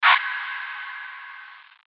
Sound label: Short Chant; drums; hip hop; STYLE KITS (Hip Hop, Etc); Khezie Hip Hop Kit